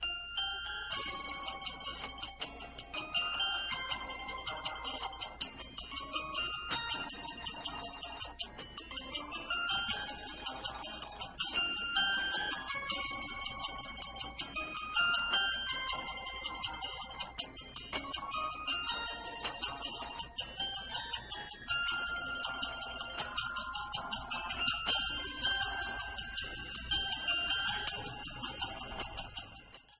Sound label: ringtone